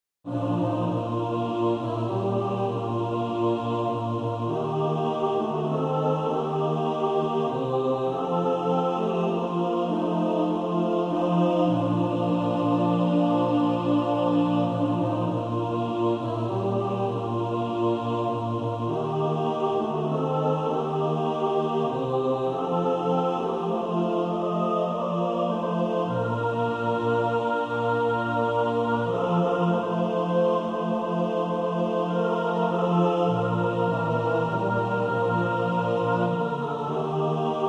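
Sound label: mixed, practice music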